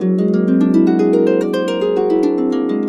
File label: GB DREAM, Harps